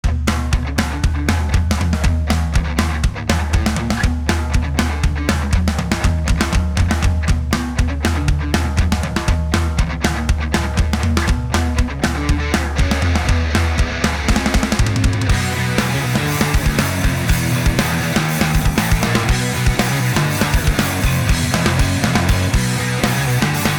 Instrumental Version; postal 3 re-engineered soundtrack 2011

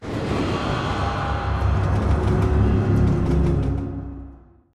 train boss defeated

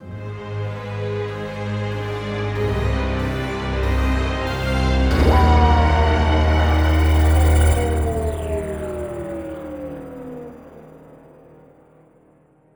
94BPM; EnterDalek

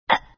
burping; Dora

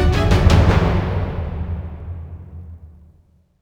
Forest End Fighting; bright memory mobile

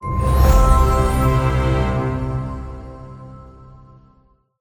Player Respawn Stinger

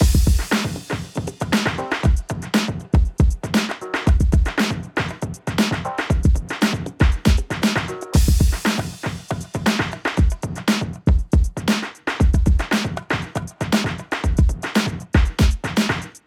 90 hiphop 118bpm; gamerip; Hot Lava

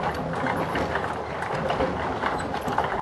War Wagon Move Loop; Linirea

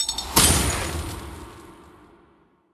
Freeze; Minion

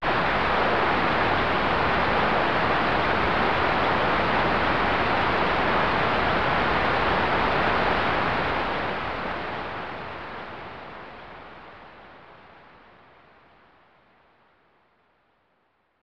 Rain; Sound Novel Tsukuru; SNES

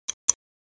Timer Tick Once; Banjo-Tooie

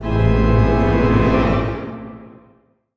Chord; Megafy Chord1; super crazy rhythm castle

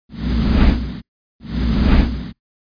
Whoosh; Buggy; PS1

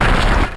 Ancient Power; pokemon reborn e19 2022

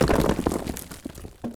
concrete secret

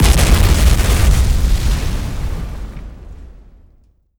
Planet Explode

